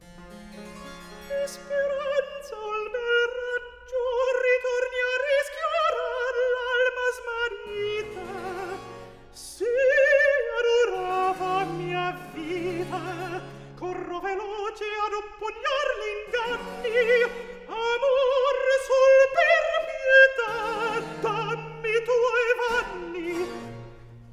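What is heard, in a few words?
Recitativo, Handel opera; David Daniels; Act 1; Di speranza un bel raggio; Handel - Rinaldo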